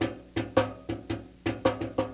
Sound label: ammo loop